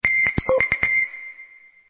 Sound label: morse, ringtones; Cherry Mobile Astro